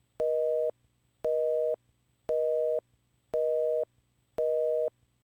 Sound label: recorder prompt end